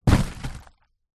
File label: impact cardboard box